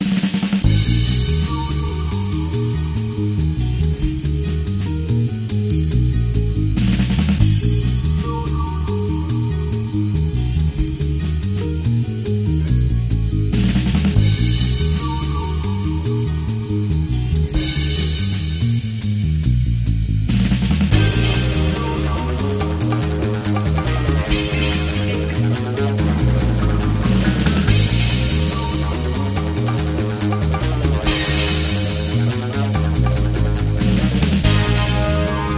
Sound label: Crazy Rumble, Underscore Guitar